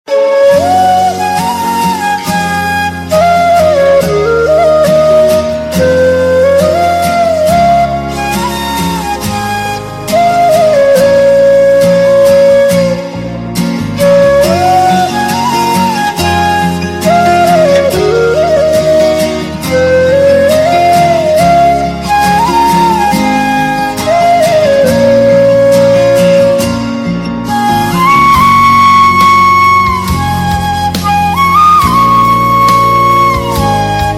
Flute; TV Serial Tone; Channel V

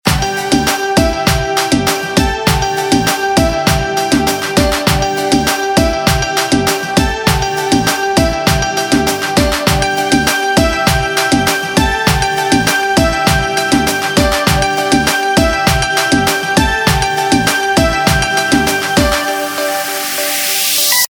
Instrumental Ringtone; Bollywood Hits (2015)

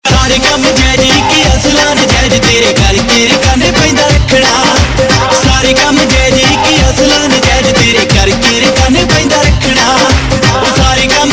Ringtone, Punjabi

